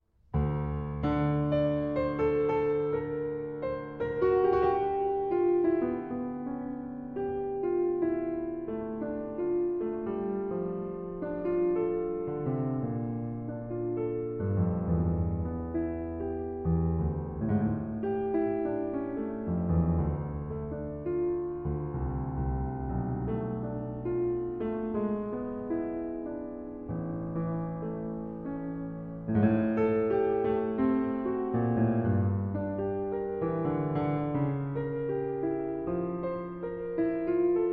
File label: Pièces de Clavecin, Suite No. 1 in D Minor, Prelude; Pièces de Clavecin, Book 1